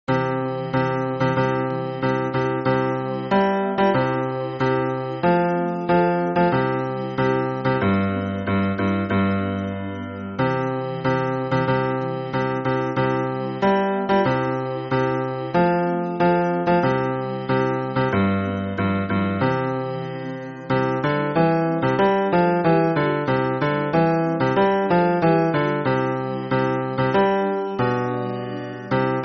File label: hymn bass